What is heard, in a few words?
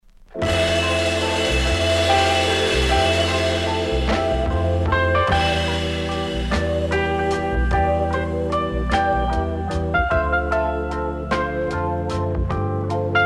Instr